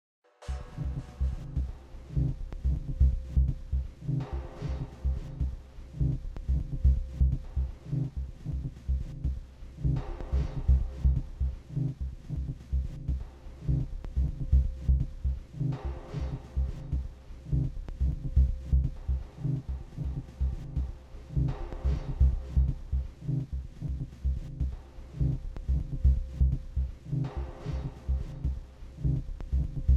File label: Blowing dub